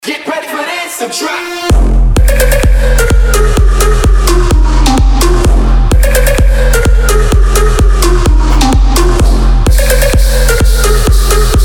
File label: sitar